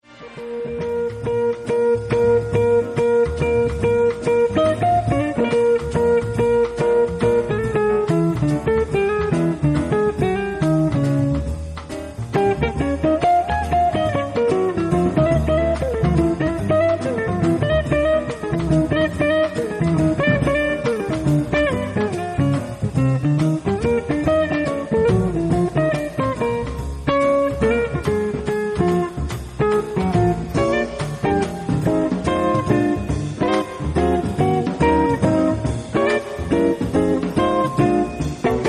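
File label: typical solo west coast blues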